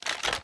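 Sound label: Inspect Movement; Valorant; cstrike; bulldog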